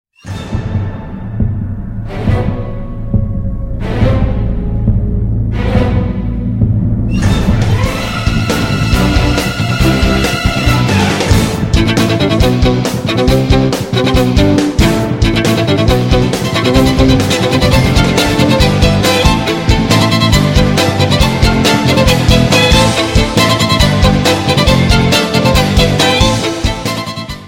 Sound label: Viol